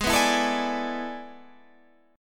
A Major 7th Suspended 2nd Suspended 4th G sharp, guitar chordshape